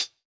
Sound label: swing hihat; Hiphop